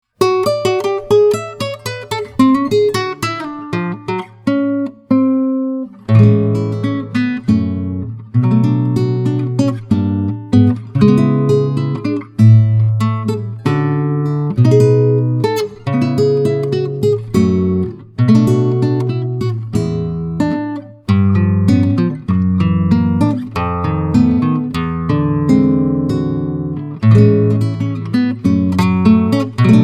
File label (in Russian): djaz etud